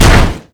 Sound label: car hit; tf2; pyrocar